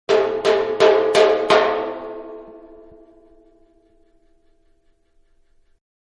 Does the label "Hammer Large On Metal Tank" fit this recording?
Yes